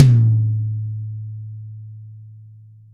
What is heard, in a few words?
NC12TMDMHL, drums toms dry; Real Mega Drums